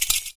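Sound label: PERC, percussions; Univers Sons - Raricussions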